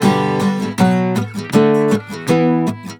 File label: EMGTR; USB Soundscan vol.42; AKAI